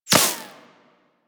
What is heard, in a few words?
Laser Impact Default; Warframe; Weapons